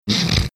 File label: snorting